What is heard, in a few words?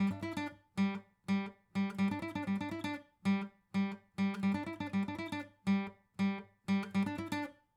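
acoustic pattern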